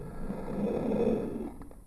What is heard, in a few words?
monster; ゆめ2っき